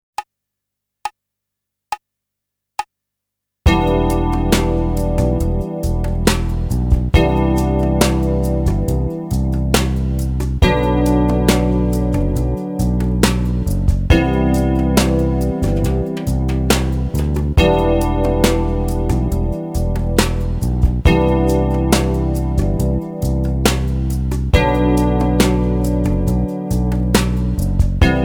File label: ballad